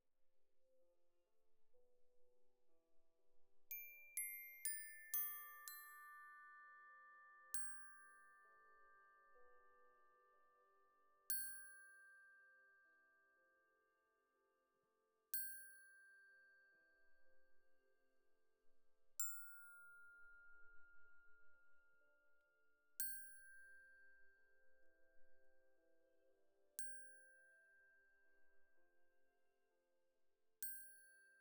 Glock Close

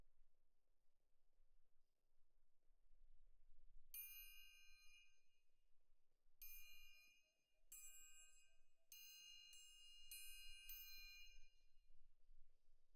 Perc Main

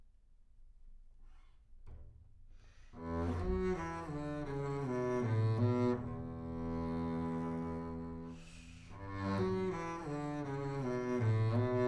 Bass Room

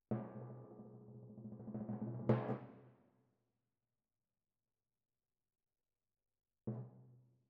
Timp Close

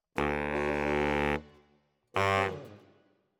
Bari, RNR for piano

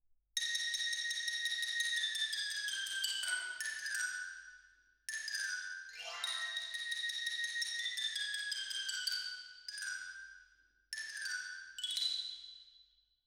Twin R Xylo

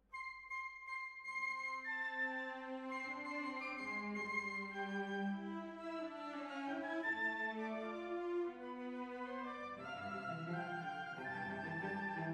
Hall

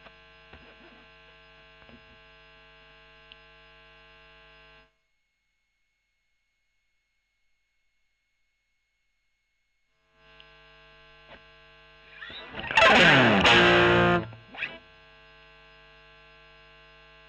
E.GTR Gefell OD

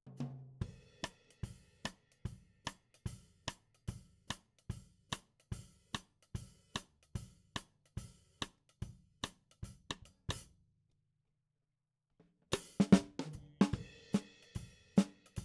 SNR top

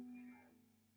GTR Royer

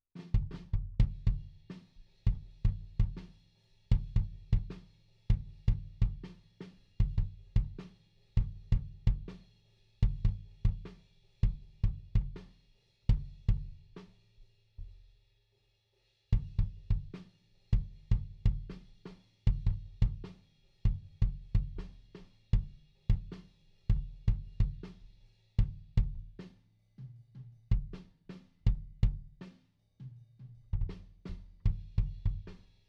Kick IN

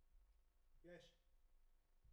Room

